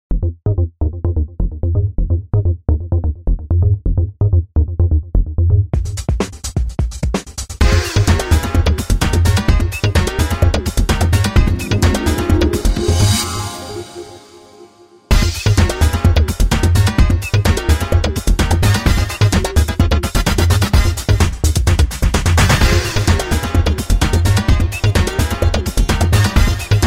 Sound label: Techno; MX500 MusicShot